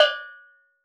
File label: Steel Drums Layer D5, Calypso Steel Drum; Percussion